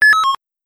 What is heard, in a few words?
Shutdown; Windows Never Released Soundpack 1; deviantart